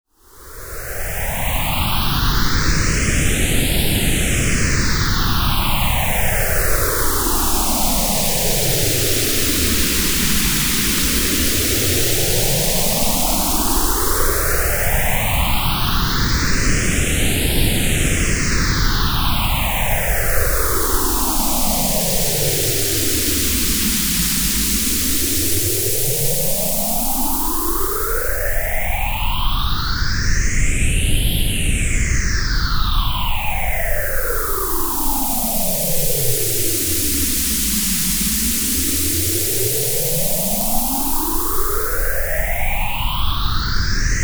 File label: MOSPhaser noise